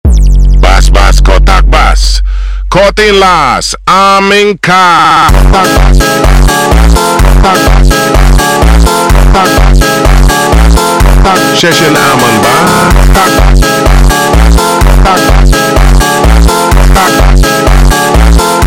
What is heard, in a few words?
Funk slowed